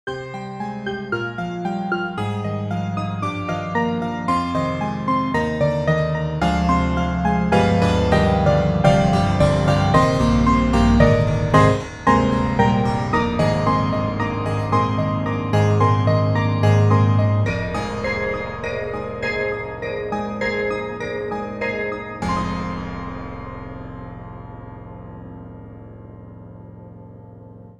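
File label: Upright Octave Mono, piano; Spectrasonics; Keyscape; Wing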